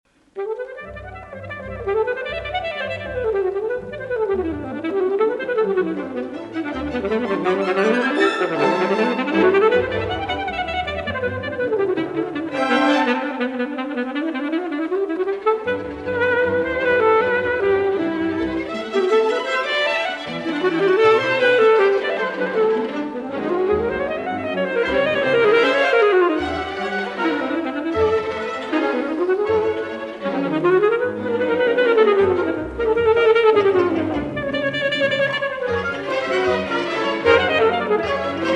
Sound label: sax class; sassofono